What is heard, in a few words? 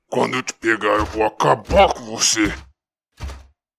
Black Knight Walk; Black Knight Walk 2